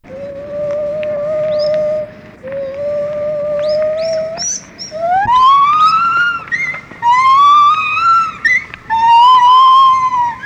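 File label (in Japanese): shirote tenaga zaru, シロテテナガザル 鳴き声; 遠くまで聞こえる声でコミュニケーションをとります。; 上野動物園; 多摩動物公園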